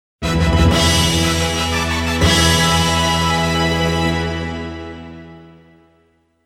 battle Win; Tap Knight; android

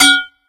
Metal Pipe Hit; Angry Birds

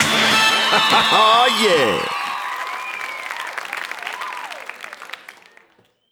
Fanfare victory; PS Vita; Funk of Titans

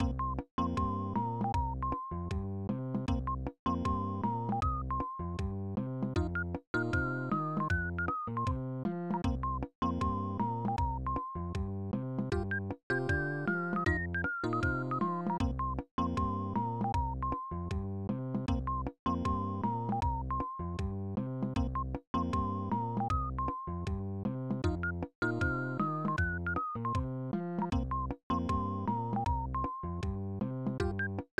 Level Music; Clock Works; SNES

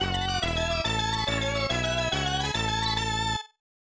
Unused Jingle; Kiteretsu Daihyakka Choujikuu Sugoroku; SNES

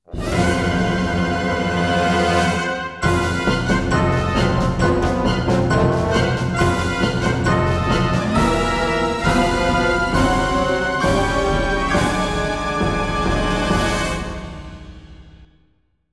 Eruption!; anno 1503 gamerip

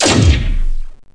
fire laser Auto

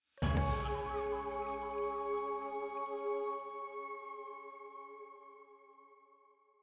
item Jingle Full Choir Flute C sharp

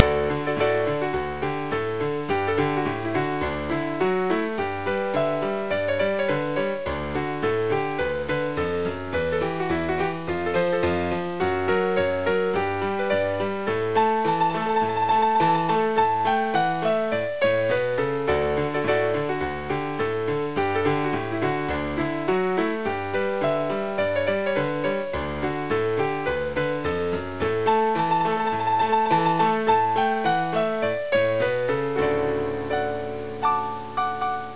Saloon Ragtime

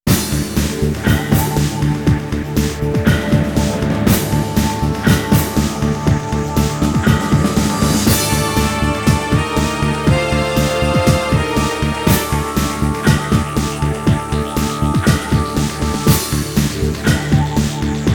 Game Clear; Quest of D

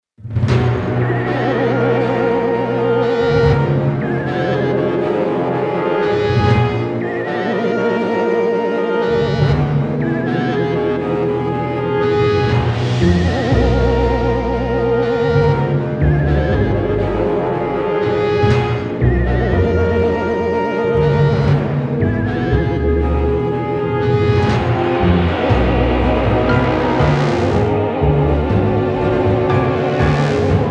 Alien world; the deadly tower of monsters

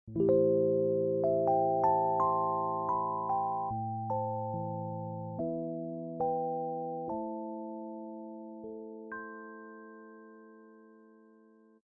Piano Electric organ; Piano app by Yokee

